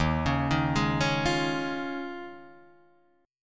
D sharp 9th Suspended 4th D sharp, Guitar DADGAD

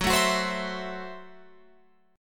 F sharp Minor 7th Flat 5th F sharp Guitar Open F; strummed; 18,16,18,19,19,19

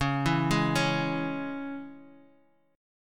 Db Minor Db; arpeggiated; JGuitar

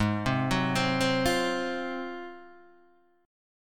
Ab 13th Guitar DADGAD, Ab13 chord; arpeggiated; JGuitar

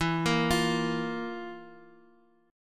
F Major 7th Suspended 4th E

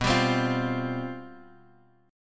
C Major 7th Suspended 4th, guitar

